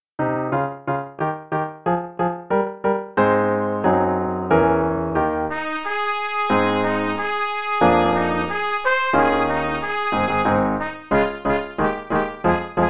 Play-a-long - March of the Tin Soldiers (no click, qn=92)_BOTH PARTS no dynamics